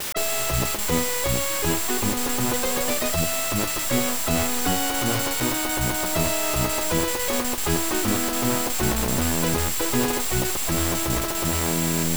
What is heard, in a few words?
Team Jingle